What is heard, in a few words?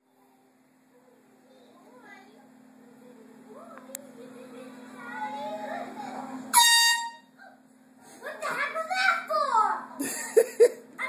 horn; bubba